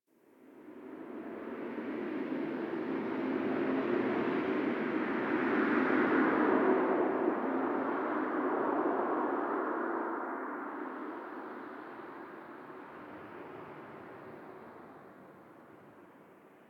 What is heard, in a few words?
Dark Wind